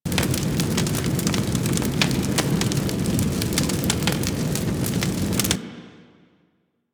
Hub Fire Loop; Warframe; Tenno Hub

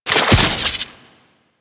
Coolant Turret Fire; Warframe; Corpus; Arachnoid; Abilities

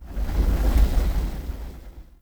Invis Rune Active One Off; Powers; Runes

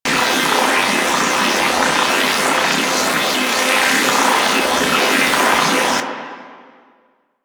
Alt Shot Loop, Weapons; Warframe; Tenno; Miter

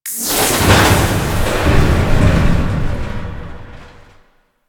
Exterior Floating Metal Scraps Start; Ambience; Props; Warframe; Railjack

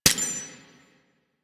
Mech, Weapons; Warframe; Gotva Prime

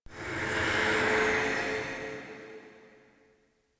Swarm Screen Scan End; Warframe; Entrati

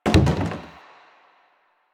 Plastic Hit Short

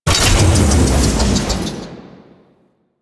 Timer Stop; Kahl Brother; Warframe; Veilbreaker; Devourer Kahl

